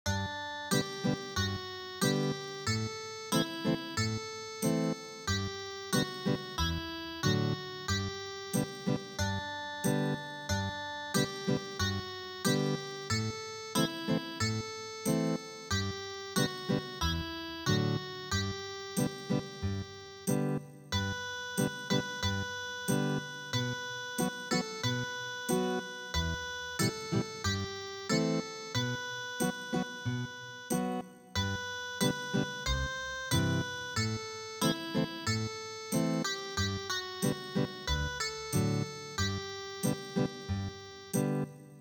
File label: Ethnic Guitar; midi; traditional